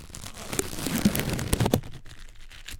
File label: Plastic Stretching